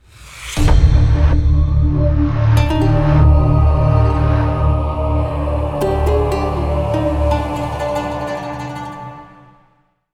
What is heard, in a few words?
Round End; Zombie Stalingrad